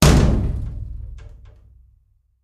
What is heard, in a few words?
Vault Inner Door Close; safes & vaults